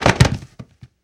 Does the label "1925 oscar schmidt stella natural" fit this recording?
No